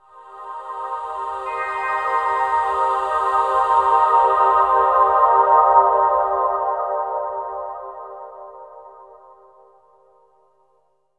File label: Cmin 7th, Pads; Zero-G Jungle Warfare 1, 2 & 3; 90 s Sample CDs